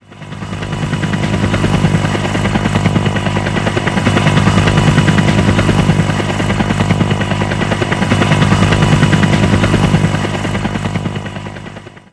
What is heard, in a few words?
osprey idle loop